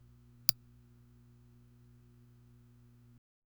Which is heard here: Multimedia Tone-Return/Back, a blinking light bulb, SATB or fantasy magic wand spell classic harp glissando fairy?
a blinking light bulb